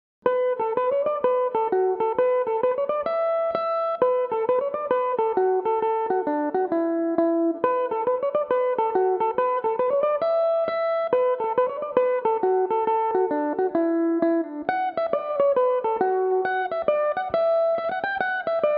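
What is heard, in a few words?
E dorian, irish mandolin, tunes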